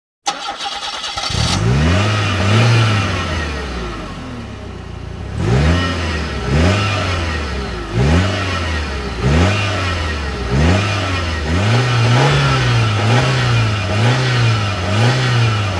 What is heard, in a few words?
Ignition Exhaust Sound; Initial D 5th Stage Sound File; Initial D Music Lossless Collection